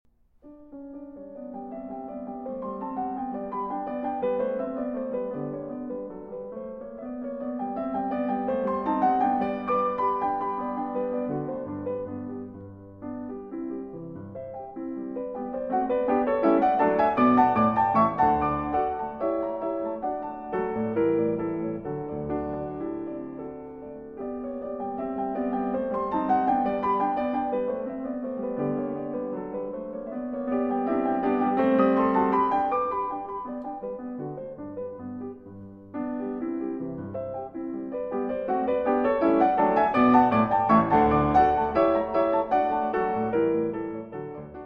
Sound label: Piano Variations in F Major